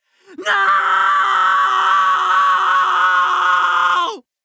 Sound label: Screaming No Without Reverb